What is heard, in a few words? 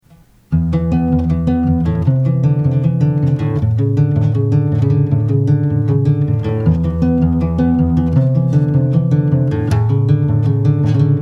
Knobby Guitar